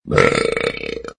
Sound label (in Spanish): flato fuerte